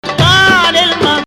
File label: Nahawand